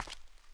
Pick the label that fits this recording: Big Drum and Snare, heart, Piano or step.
step